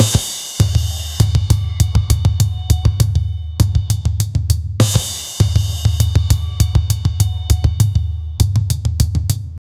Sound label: drums, ethnic